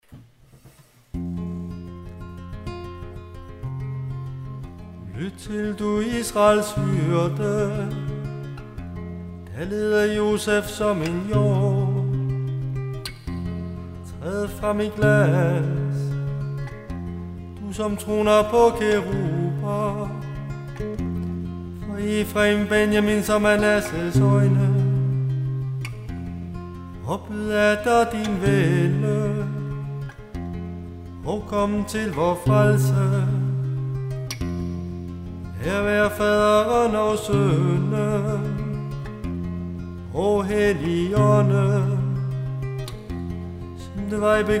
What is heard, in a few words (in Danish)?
tone gregoriansk